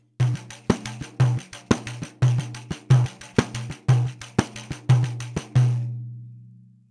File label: Caixa